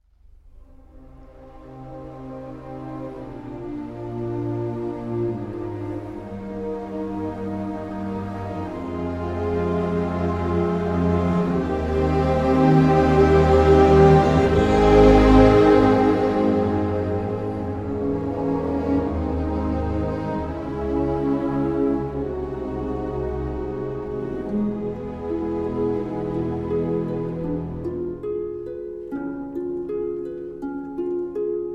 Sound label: Piano Concerto; Rachmaninov 2nd Piano Concerto